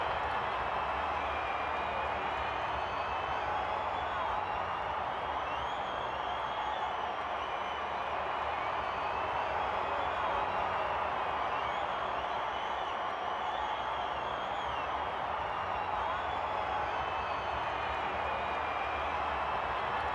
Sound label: live, drums; virtual drum sounds; custom drums